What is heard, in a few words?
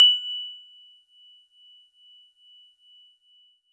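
Celeste DX100 F#1; Pristine Celeste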